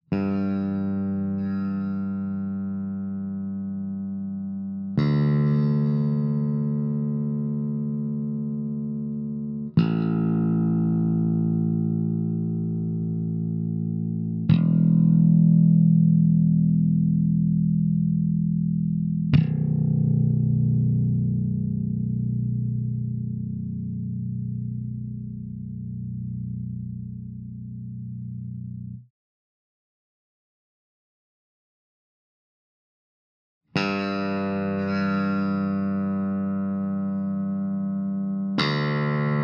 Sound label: Washburn RB 2500 Custom Modified Open Strings; EQ-no boost; EQ-High boost; EQ-HighLow boost